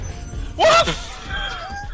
woof

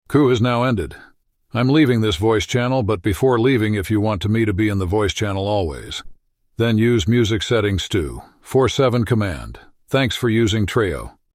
music ended

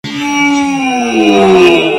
death sound

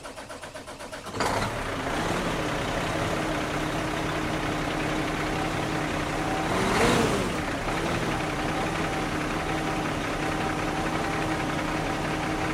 semi startup

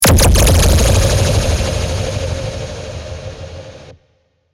high electronic laser shot machine

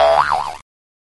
cartoon hop; soundbible

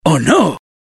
hitsound

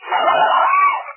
hahahahaha

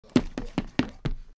running footsteps sound effect

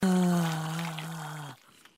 wet

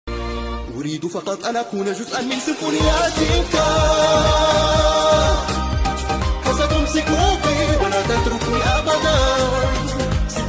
arabic symphonyyy

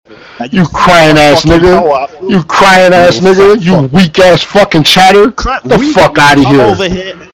crying

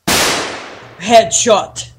headshot

